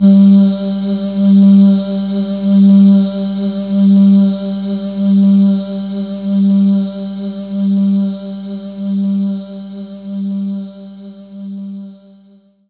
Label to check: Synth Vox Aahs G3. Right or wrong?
Right